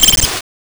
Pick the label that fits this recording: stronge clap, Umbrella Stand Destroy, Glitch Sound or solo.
Glitch Sound